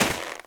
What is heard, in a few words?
snow land; Golden Crayon Sounds